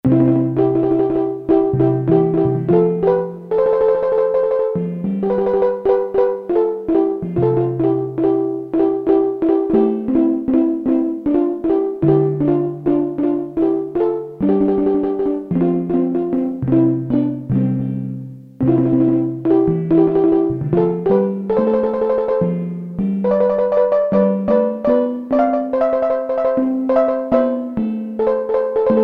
St.Dr., Ethnic Perc, Korg DSS-1

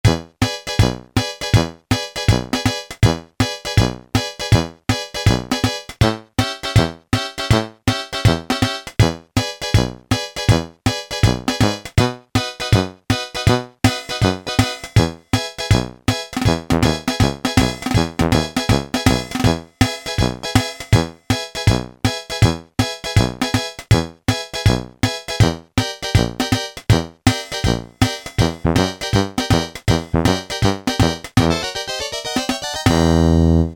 DIXIE BANJO, Yamaha SHS-10; Factory style demos

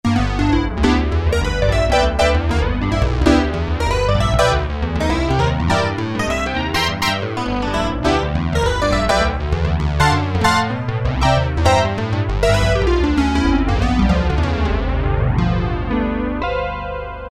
Piano 2, Roland Alpha Juno-2; Factory A

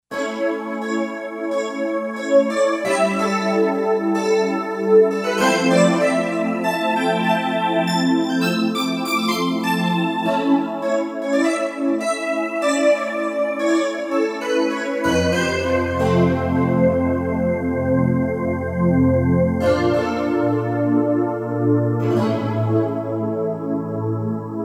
Heaven Key, Roland SC-880; Factory Patches

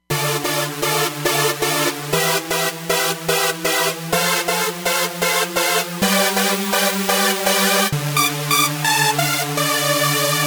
JP-8000 Saws; Roland Fantom XR

